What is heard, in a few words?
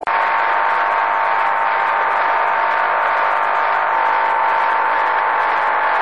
buzz; 18:25頃から数分間だけバズ音らしきノイズが入感した; この音が聞こえるときはバルカン半島周辺にパスがあることが多い; 山崎先生の運用記です